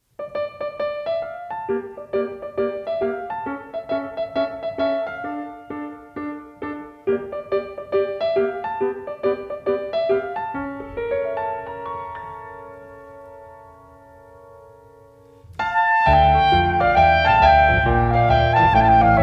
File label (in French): instrumental